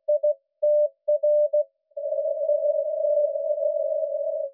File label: eme echo, 432mhz; pe1itr